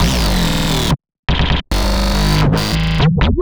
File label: 140 A BASSLINE, Dubstep; Loopmasters; The New Sound Of Dubstep; BASS LOOPS